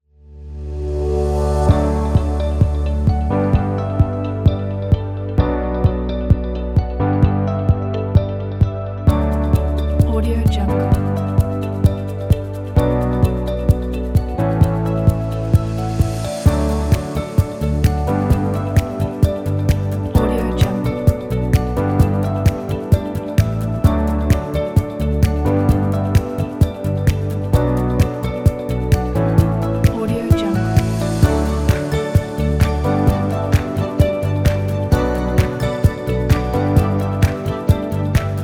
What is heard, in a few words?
building business inspiring corporate